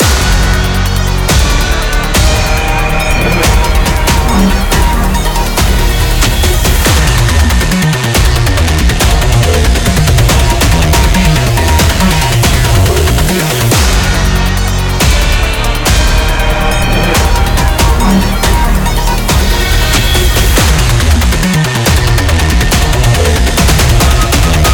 Boss 3 Teir 1; Voidbreaker; ps5 windows xbox series xs

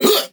Vocal Pitched "Huh"; Tokyo Machine Bass House Sample Pack FX & Vocals; Dropgun Samples

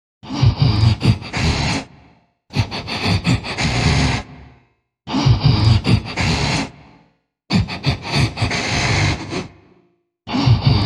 Breathing

